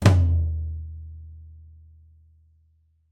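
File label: New Jazz Tom Low Flam, Roland BK-5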